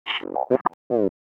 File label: rock; space kitty